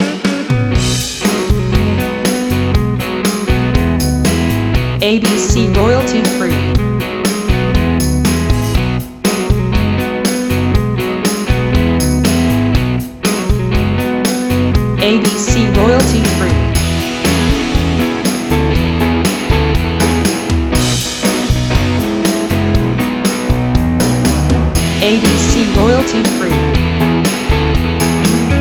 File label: Cool Indie Rock